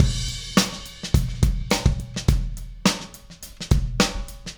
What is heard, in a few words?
Beat Crash; Sonic Foundry (Sony Creative Software); Indie Rock Anthems